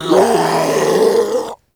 Infested Ancient Strike Scream; Warframe

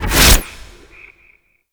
Experiment Riffle Shot Stop, Weapons; Warframe; Corpus; Sent Experimental Riffle

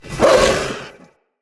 Corrupted Battle Dog Attack Bite, Animals, Vocals

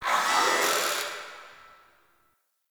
Gas Machine Rand; Warframe; Corpus Gas City; Ambience

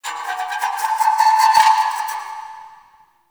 Zariman Sparks; Warframe; The New War; Ambience

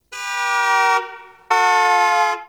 Car Alarm Destroy; Warframe; Ambience; Nineteen Ninety Nine

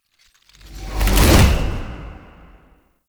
Gold Hand Transition; Deadlock Protocol; Cinematics

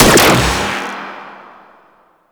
Shotgun Mech; CorpusShotgun

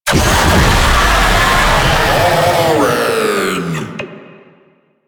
Death, Vocals; Proto Infested Babau

